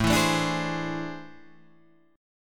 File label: A Minor Major 11th, down